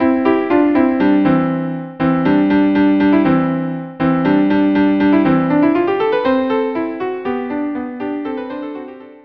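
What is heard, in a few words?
Allegro viola viola duo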